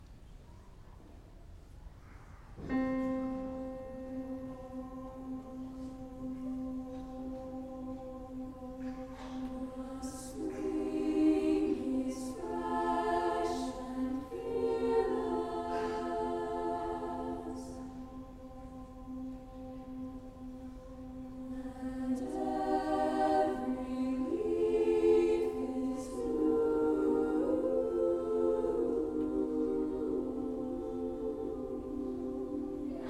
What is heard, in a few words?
soft rain, choir